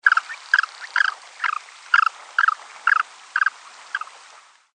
ouette des andes

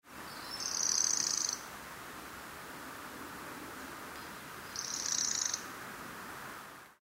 grive draine, chant

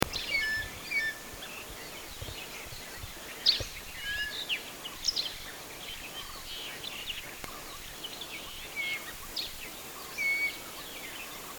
aigle huppe, chant

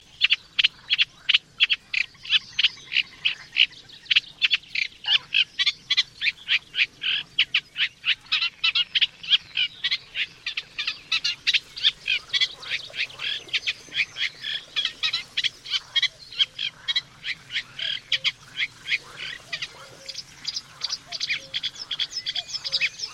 rousserolle effarvatte; chant

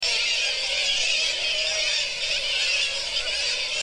mouette tridactyle, chant

